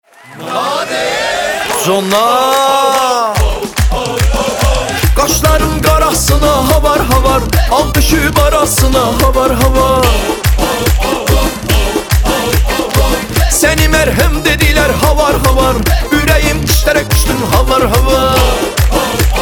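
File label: remix Turkish happy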